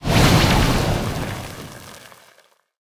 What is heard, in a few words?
gadget; carl; Brawl Stars